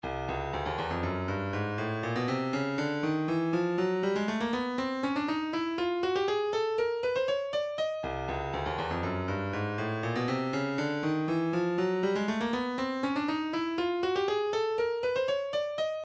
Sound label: 120 Small Mix, midi